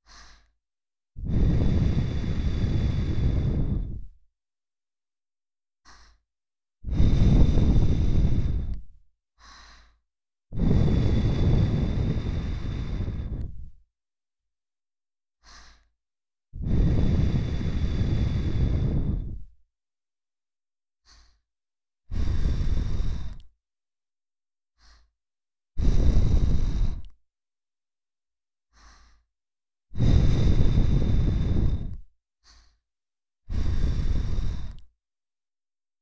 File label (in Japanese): 息吹きかけ（左）